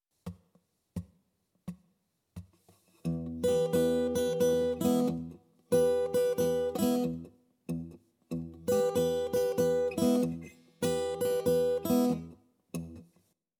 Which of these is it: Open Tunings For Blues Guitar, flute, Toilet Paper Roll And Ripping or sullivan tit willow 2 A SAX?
Open Tunings For Blues Guitar